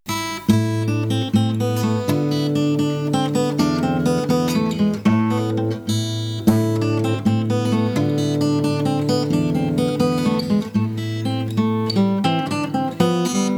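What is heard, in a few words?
concert guitar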